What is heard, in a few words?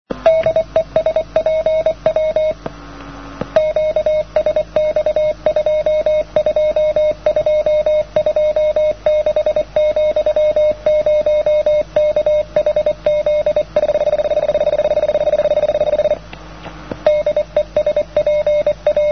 cw only